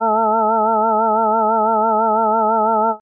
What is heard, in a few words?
vibrato, balso akustika